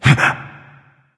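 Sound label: nonverbal surprised; smite; voicepack; ullr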